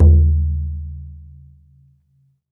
BODHRAN

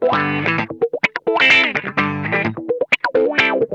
ROCKIN, Funk Guitar; 90 s Sample CDs; Zero G

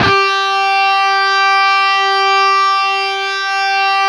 LEAD G 3; East West; Steve Stevens Guitar Samples Collection

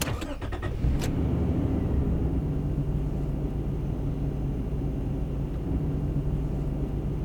ENGN STRT; AKAI S-Series CD-ROM Sound Library VOL-6

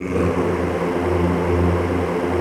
F1, Extended Classical Choir; Best Service

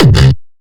bass kick

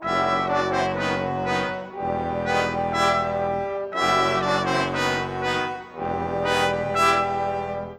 Brass; Sonic Foundry (Sony Creative Software); Orchestral VOL-2; Modern 35

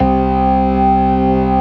STOPS, Synth and Organ; InVision Interactive; Keith Emerson; The Most Dangerous Synth and Organ; ALL STOPS

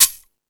Percussion FX, E-MU Pro-Cussion

